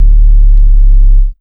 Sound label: SUB, Ragga & Dub Reggae; USB Soundscan vol.27; AKAI